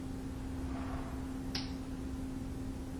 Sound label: chair moving and finger snapping sound